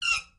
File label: squeak